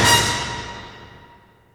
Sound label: ORCH; Crypton - DrumBase5000